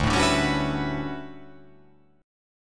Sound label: D Major 13th D down